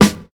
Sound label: thick snare drum sound g sharp, Royality free snare drum sound tuned to the G# note; snare drum samples